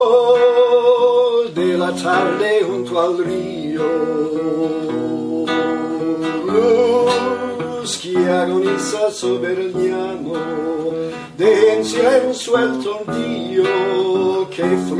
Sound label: colombian song ringtone